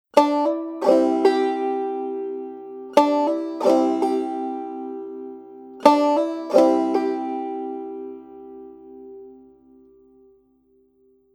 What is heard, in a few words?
Hammer On